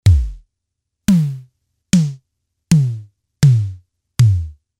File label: Tama TS-305, MEMORY